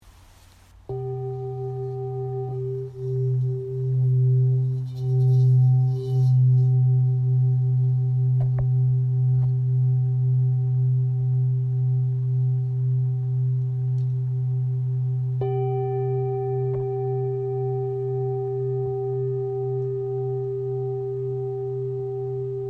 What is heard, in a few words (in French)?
Bol bengale do